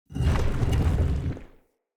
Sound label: Stone Pedestal Stop; UnderCity